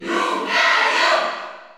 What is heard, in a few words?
Cheer Spanish; Lucario; SSBU